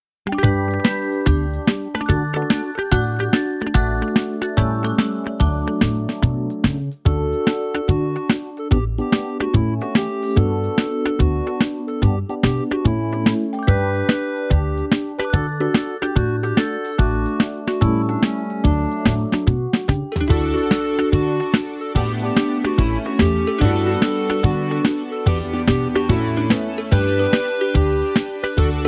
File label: Instrumental